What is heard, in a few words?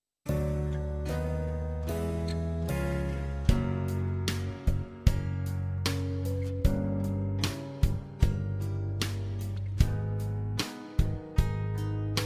(D) No Backing Vocals